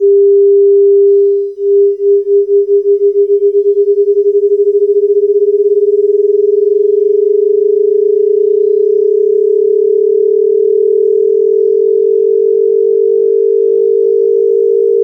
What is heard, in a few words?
Critical Band; banda critica